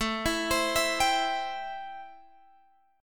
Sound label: A-Minor 7th A Guitar Open G